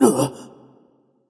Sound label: confused; smite; voicepack; hou yi